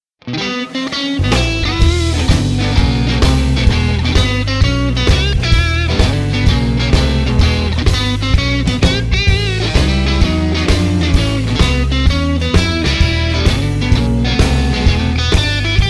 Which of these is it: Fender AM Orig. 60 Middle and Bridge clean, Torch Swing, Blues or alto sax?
Blues